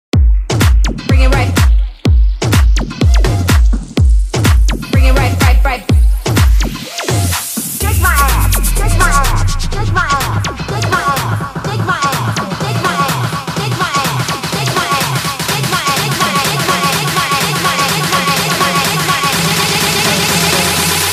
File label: electro, ringtone